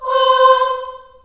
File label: C4 child AH, choir; CHILD AHS